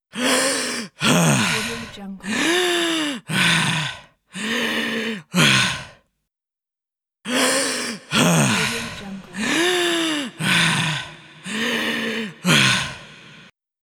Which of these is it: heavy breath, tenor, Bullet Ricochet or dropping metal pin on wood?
heavy breath